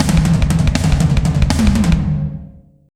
120 BREAK, DRUMS

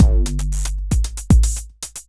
808 Kick n Hat; Sonic Foundry (Sony Creative Software); Ugly Remnants VOL-2

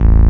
303 BASS

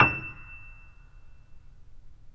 PP E6, Grand Piano; AKAI S6000 CD-ROM - Volume 2; Grand Piano 256M

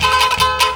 TANBURA; Best Service; World Colours

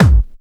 kick techno, drums; Techno Trance Essentials

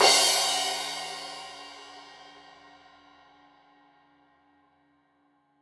Crash 15in L edge, Cymbals Stereo

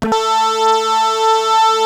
PPG A3, Rare Synthesizer; Hollywood Edge; Giorgio Moroder Rare Synthesizer Collection; PPG.2.3

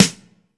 DRY SNARE; E-MU Formula 4000 Series Vol. 5; Protozoa

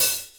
Open Hhat, Hihat; Equipped Music; Loungin House

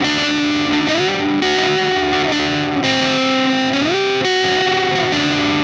Static FX Guitar

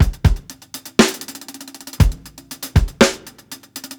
120 HALF TIME ROLL, DRUMS; LOOPS; BASEMENT DRUMS VOL 2

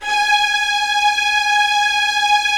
STR, STRING; Roland - LA Composer vol. 1

